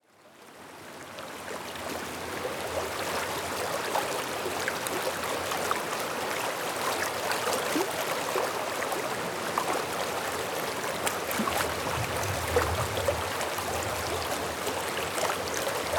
Gentle Stream

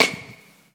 Shoe Sole Rubber Squeak Tile Hall

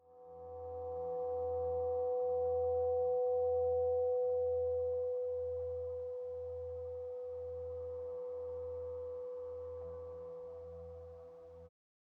Guitar Feedback Tone Through Amplifier

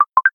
Message Notification

